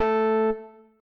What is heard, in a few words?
countdown; tetrio